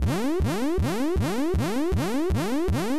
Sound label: alert Synth